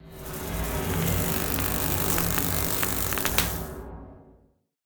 swarm distraction

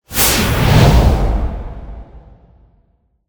Gust

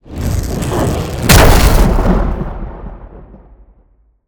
Lightning strike

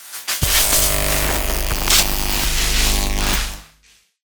spark wall

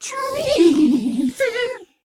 WATER FISH

